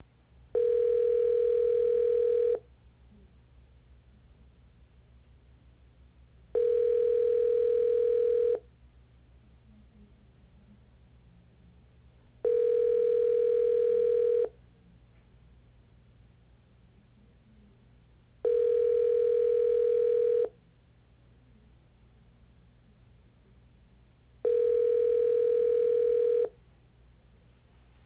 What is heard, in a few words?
telephone ringback